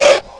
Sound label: Bark, Small Spearleg, Aliens